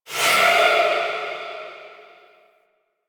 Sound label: Evolving Weapons UI Node Select D; Zariman